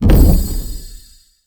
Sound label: Casting Device Impact Damage; Weapon Impacts; Player; Casting Devices; Galene Casting Device